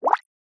cartoon bubbles

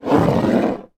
household aftershave bottle scrape across table

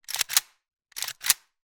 gun ak 47; still north media

